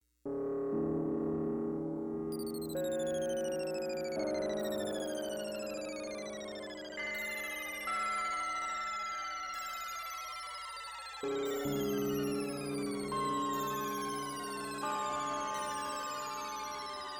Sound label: Memory Moog